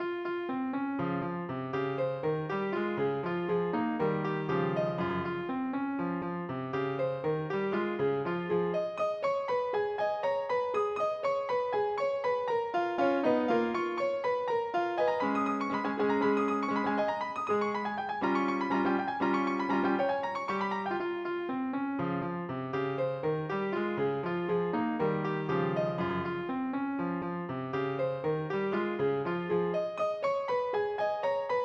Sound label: piano sonata